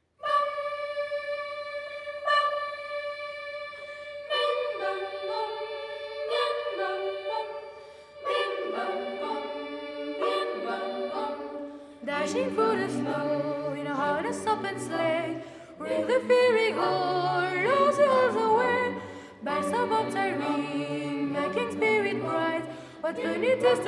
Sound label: Jingle Bells